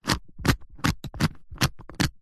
foley luggage briefcase combination lock dials turn; zapsplat